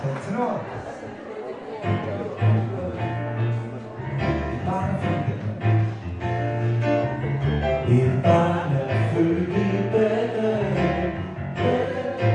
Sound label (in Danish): tenor, kor; et barn er fodt i bethlehem